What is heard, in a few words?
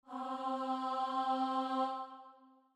starting note, voice; gradually increasing